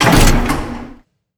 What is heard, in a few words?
spotlight stereo; Call of Duty Modern Warfare